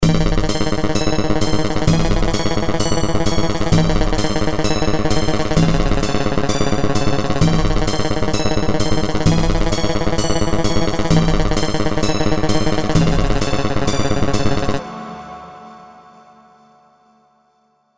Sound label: piano